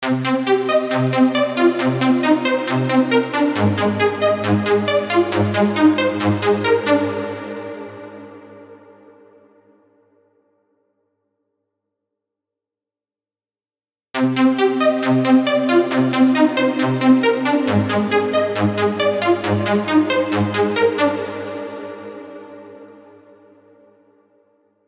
seq mono wet variations

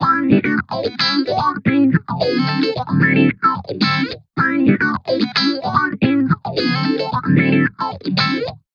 Funk guitar original hi color

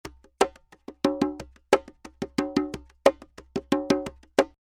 congas dry

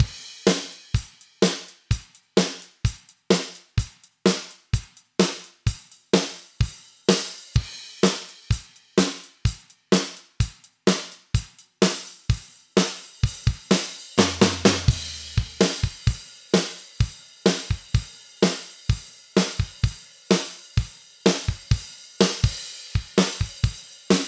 T drums no comps

